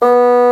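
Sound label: Bassoon B0; Digital Sound Factory Virtuoso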